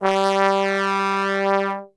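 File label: G2 bass trombone, Brass; Best Service; Brass Super Section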